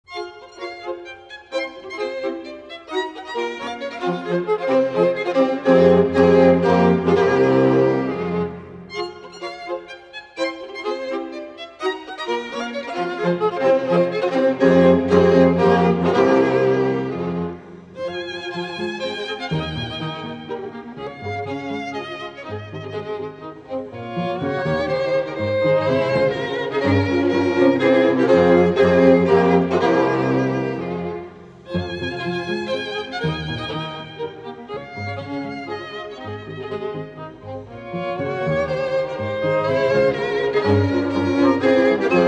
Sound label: MUSIQUE; PRINT GALLERY